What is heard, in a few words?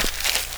grass, footsteps; 1000fx